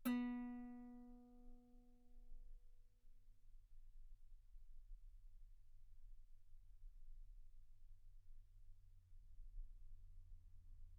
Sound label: Guitar ff B3